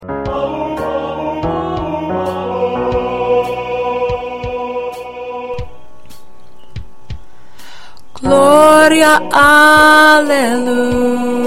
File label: Lead